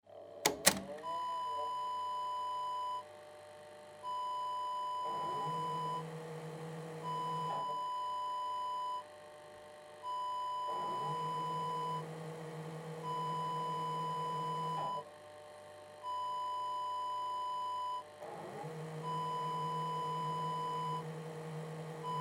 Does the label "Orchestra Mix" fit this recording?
No